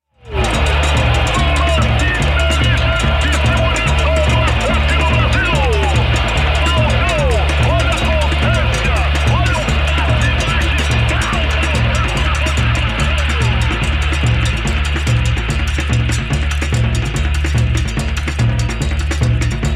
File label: batucada berimbao